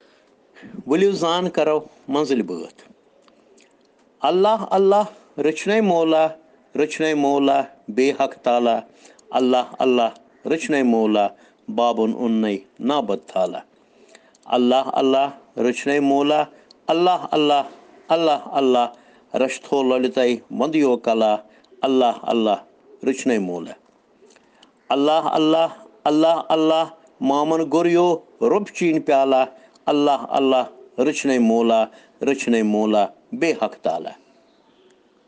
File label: Children Rhyms in Kashmiri